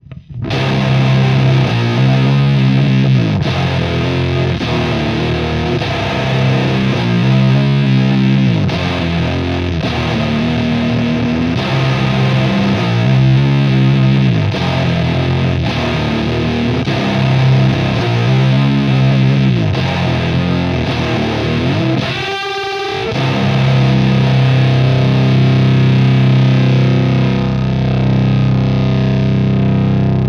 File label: GTR SCOOPED WITH ROAR